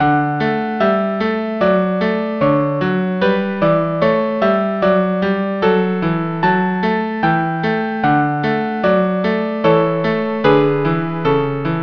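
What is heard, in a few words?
violin cello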